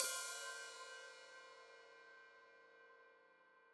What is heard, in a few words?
Hat Open, Hi-Hat; Deeply Sampled Drums